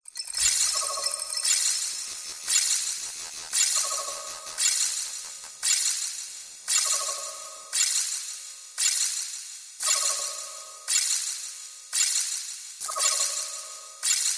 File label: robotic factory machinery futuristic mobile app pick up sci fi mechanical glitch